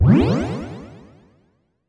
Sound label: Spell waves